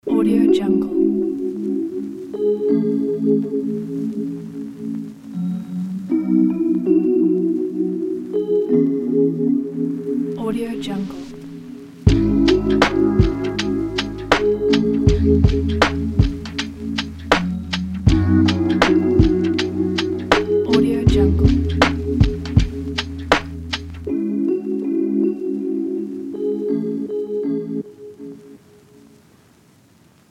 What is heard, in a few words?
a lofi logo